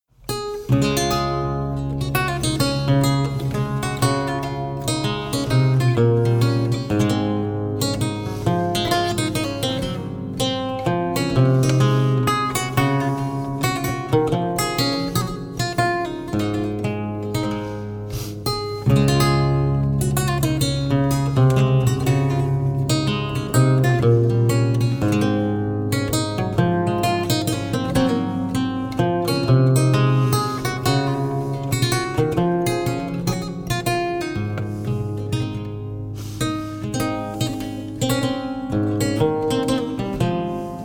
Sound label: Courante II